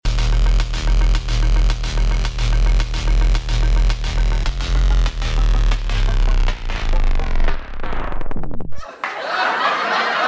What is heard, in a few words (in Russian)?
temp zamedlenie i smeh; iz tik toka